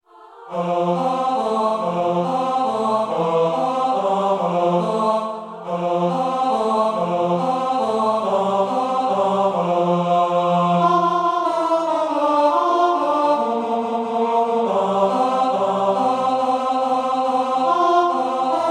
Escondido Tenor

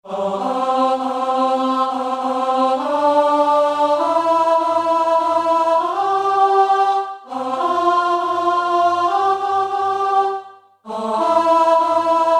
Alto

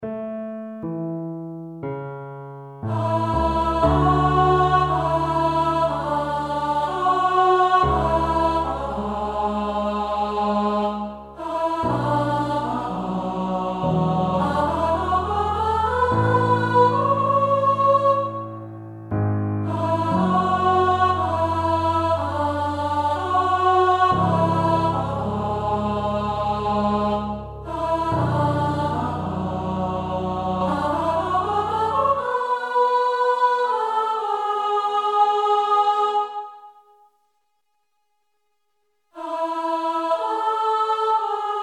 Alto

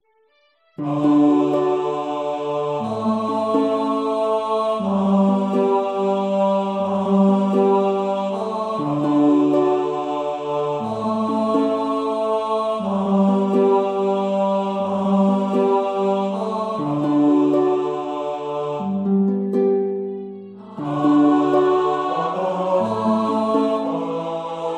Bass

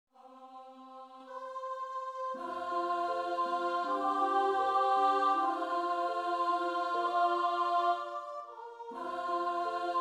Soprano

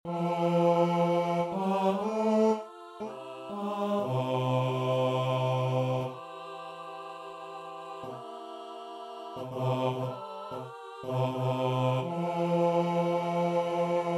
bass

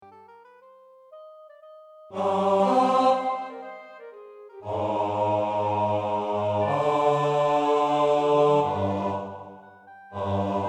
Bass

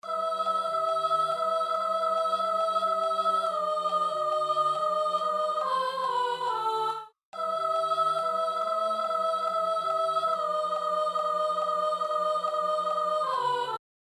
Top Harmony

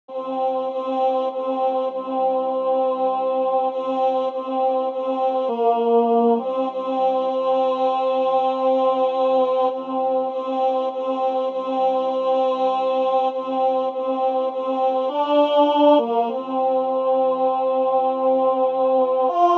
Song of Peace Tenor

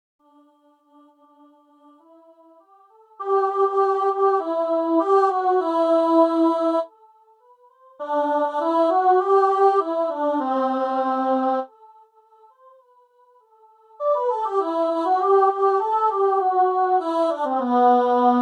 Alto

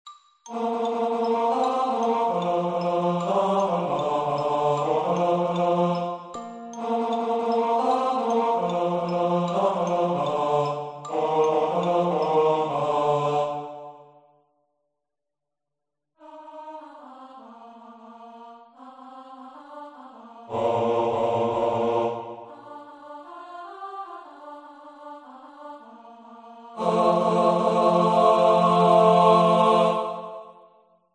Men

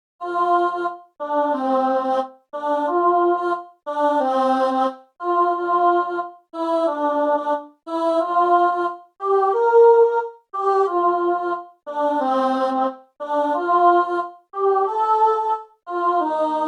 Dancing Alto

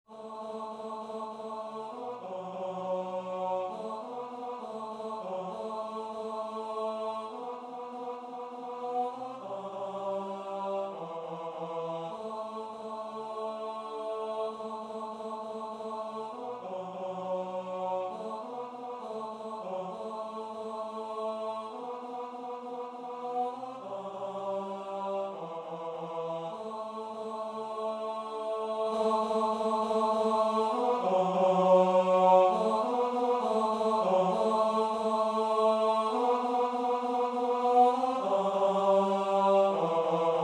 Tenor

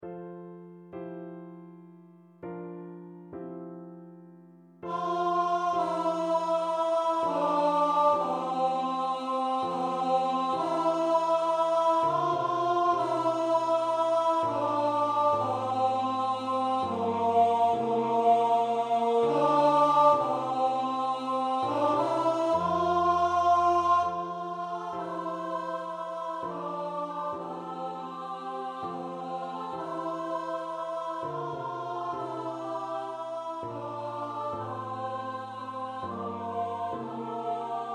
Tenor

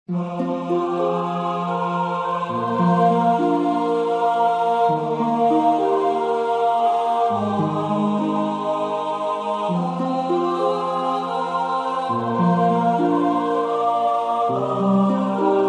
Tenor

